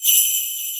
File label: SLAY BELLS; AKAI S-Series CD-ROM Sound Library VOL-2